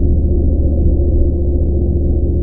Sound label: ENGINE; NIHILIST